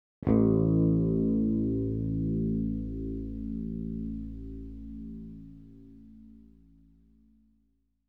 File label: bass A1; KORG - Sound Sampling Collection VOL-5 - Drums & Bass Fusion Funk Effect